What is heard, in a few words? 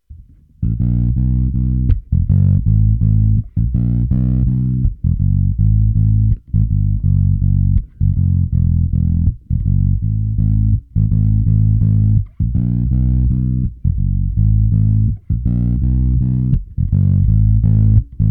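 reggae fingered, Bass Driver D.I.; sansamp; Reggae - Fingers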